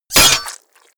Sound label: sword impact gore long